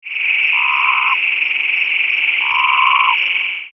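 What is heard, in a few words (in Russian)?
лягушачье болотное логово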